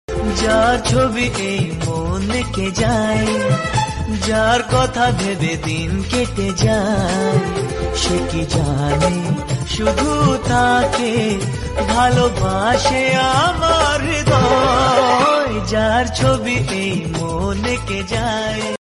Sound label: lofi bengali song